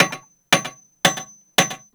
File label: Village Hammering One Off; Warframe; Eidolon Plains; Ostron Village